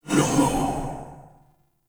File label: Grimoire Fire Whispers; Warframe; Tenno